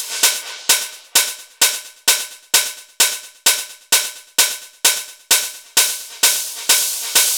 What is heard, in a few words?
Hat Loop 130, Techno